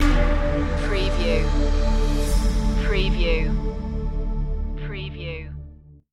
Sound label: forest fantasy stinger